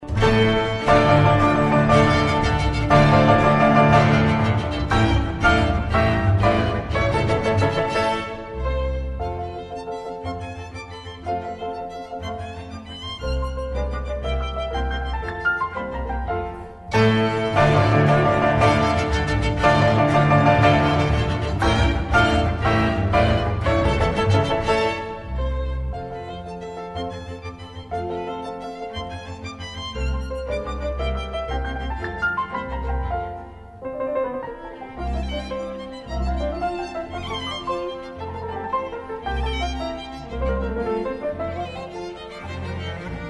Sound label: piano quintet a major; schubert